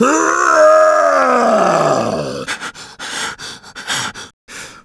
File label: yell; shadman